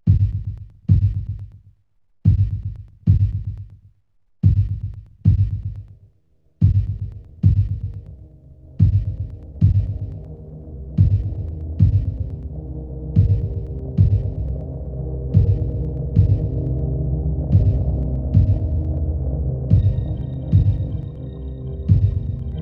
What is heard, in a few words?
Lullaby